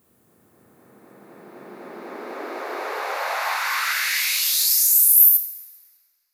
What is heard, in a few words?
FX Swipe Up